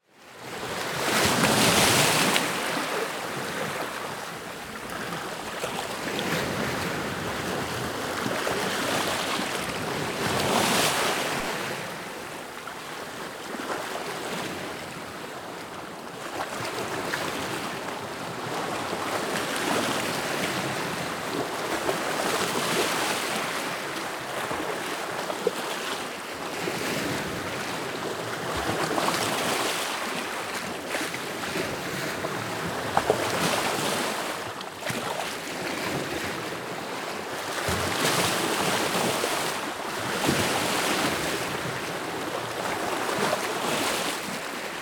Sea, english channel, beach ambience, close medium waves; Ile de Chausay, Normandie; lilesound